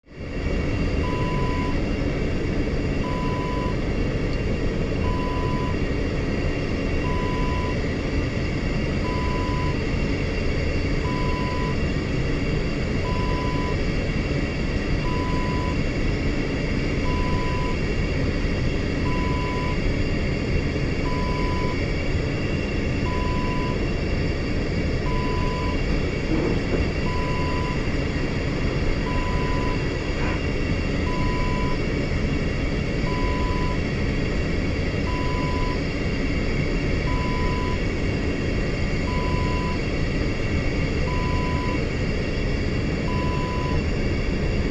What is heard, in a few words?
airplane toilet ambience